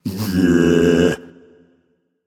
tongue; smite; agni

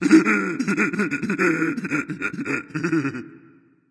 laugh; Smite; cabrakan

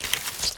vine pulse; smite; god artemis

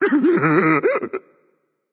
laugh, voicepack; smite; jorm

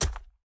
scorpion footsteps; smite; npc

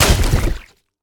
zombie bodyfall; smite; ahpuch skin10

